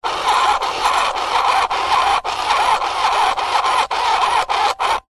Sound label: science fiction robot small tracks wheels motor whirr short bursts walking